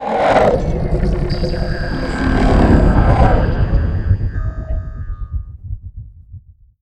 Cave Guardian Fly Away; Journey

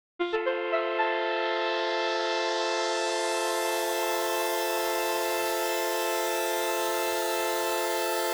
Synth Swell F; Loops; Tonal; Ableton Live 12 Lite; Core Library

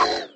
game got hit; There; ThereClient; gamekit; gunkit

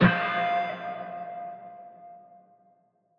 Echo Strike; One Shots; FX; Ableton Live 12 Lite; Core Library

